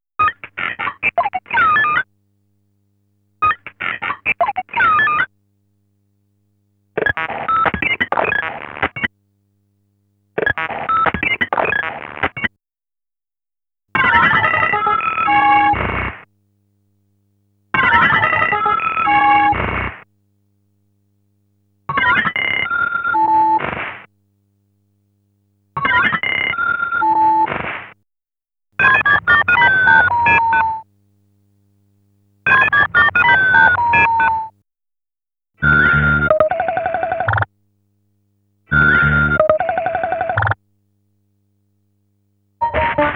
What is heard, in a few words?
Flying Guard Robot, SFX; GITS; Stand Alone Complex